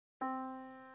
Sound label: yamaha Disk